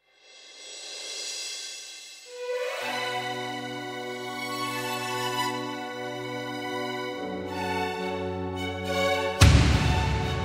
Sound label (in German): 80er Jahre Sound